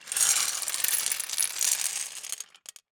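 Gumball machine, metal, glass, candy, flip, jostle, clatter, wide; Eiravaein Works; Vaeyan IV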